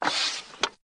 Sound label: Tripod Leg Sml Smoot